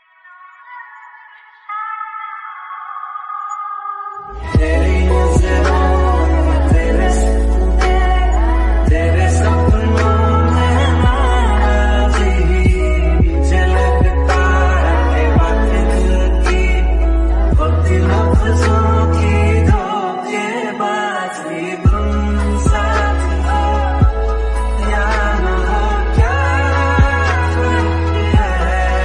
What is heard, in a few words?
Female Love Song Ringtone